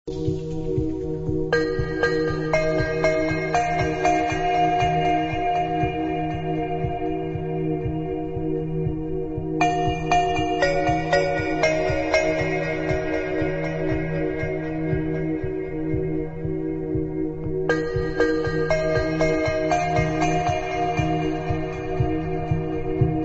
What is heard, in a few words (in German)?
Das Glockenspiel